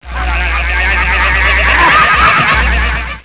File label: electrocuted